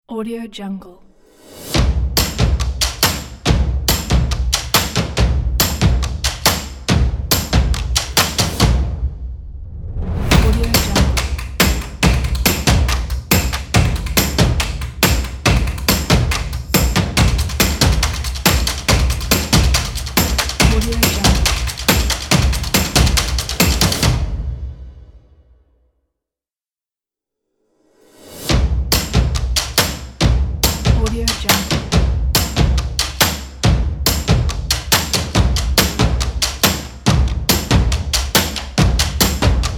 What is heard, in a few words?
percussive power action sports; joe-stu